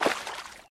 Splash; Terraria

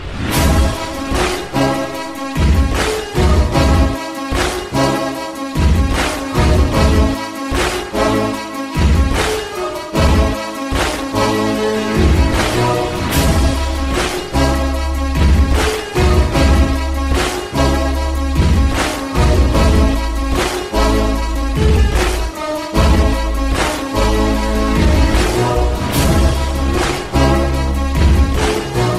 Violin Orchestra

